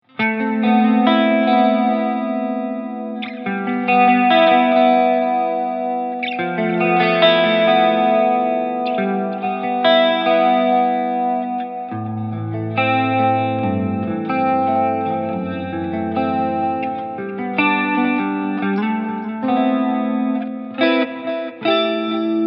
NUX MG-101 LX Clean

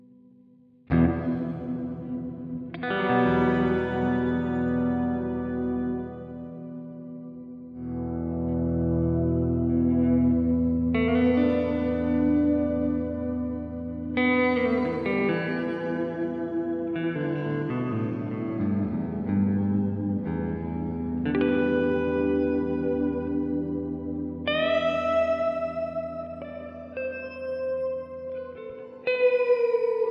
Keeley Realizer Reverb Hall

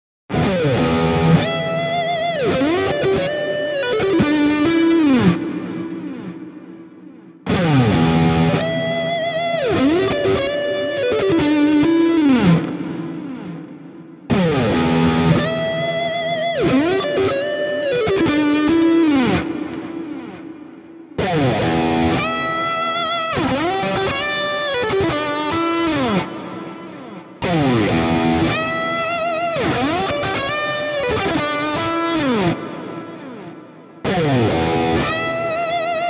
Ibanez AR520HFM Lead all positions Licks

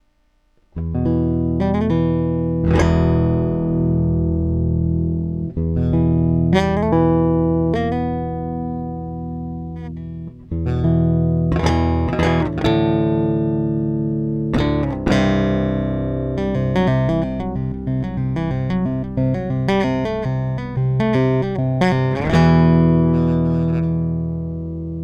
Bridge Active Chords

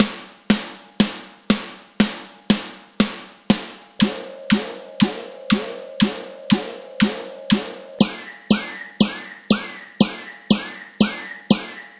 snares

